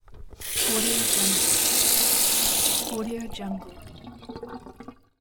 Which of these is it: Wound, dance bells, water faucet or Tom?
water faucet